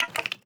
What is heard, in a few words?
Stop On Stone; netent